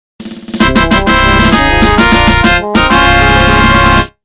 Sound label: Mobile Tone; Loz